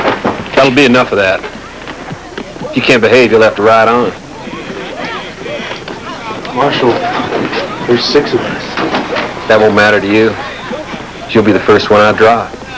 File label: drop